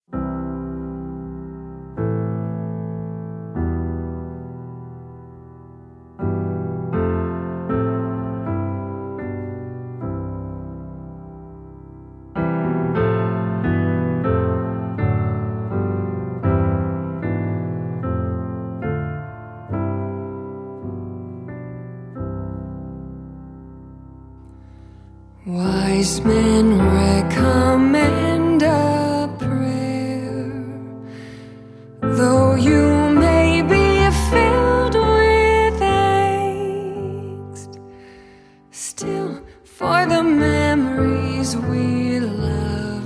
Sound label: Hymn of Thanks